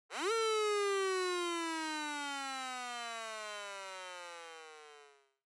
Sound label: Police Car Siren Dry